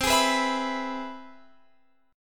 C Augmented Major 7th

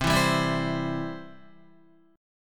C Major, Guitar; strummed; JGuitar

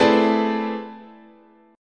A Minor Major 11th, guitar chord

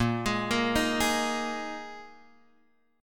A sharp 7th Suspended 4th A sharp, Guitar Open G

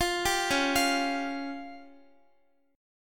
Db Major Flat 5th, Guitar; arpeggiated; JGuitar

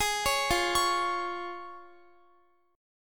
F Minor Sharp 5th F down Guitar; arpeggiated; tuning 4E,4A,5D,5G,5C,6F

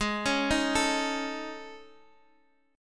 A Major 7th Flat 5th

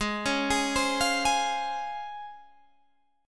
Db Major 7th Ab

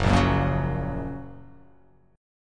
F sharp Major, F sharp, chord; strummed; JGuitar

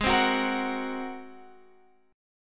G sharp 9th G sharp Guitar Open F; G#9; strummed

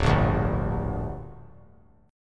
D Suspended 4th Sharp 5th D down, chord; strummed

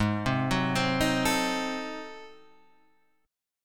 G sharp 7th Flat 9th G sharp, chord; G#7b9 Chord {4 3 4 5 4 5} arpeggiated

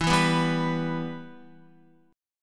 E-Minor, Guitar; strummed; JGuitar

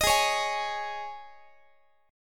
G sharp Suspended 4th G sharp, Guitar; strummed

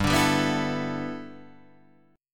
G 6th Add 9th

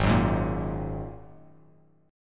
G sharp 7th Suspended 2nd G sharp Bass Standard; G#7sus2; strummed; 4,9,6,3; JGuitar

